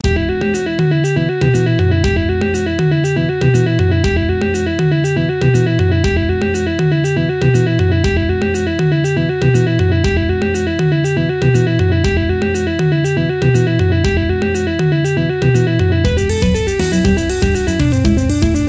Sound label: Brazilian Legato, guitar; legato exercise